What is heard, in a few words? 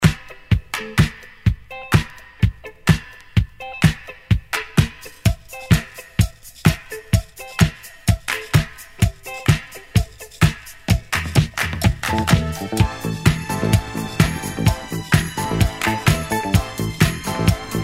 Instrumental